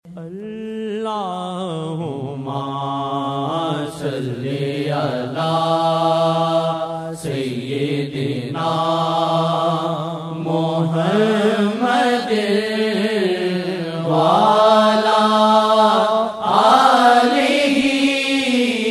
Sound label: naat; lailatul qadr hamaray liye aaqa saw ki nazar